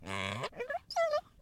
nepriyatnij zhalkij korotkoj pisk kojota

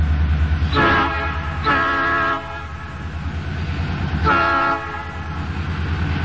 otrivistij zvukovoj signal poezda

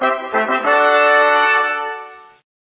fanfari podvodyashie itogi

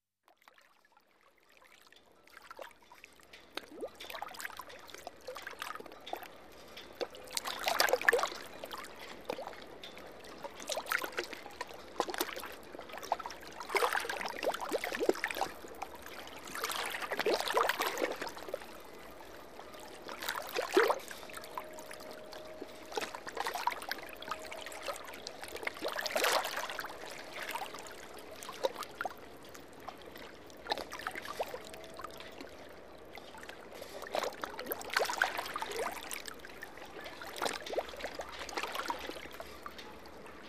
plesk vodi po blizosti lodki

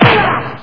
krik vo vremya kulachnogo boya

